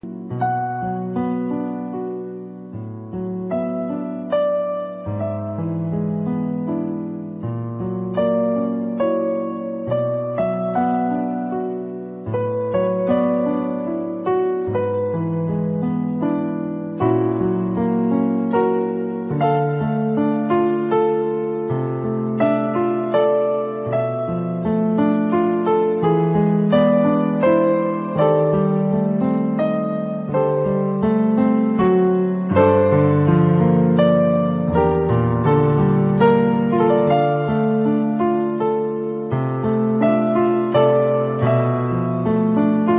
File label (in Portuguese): Musica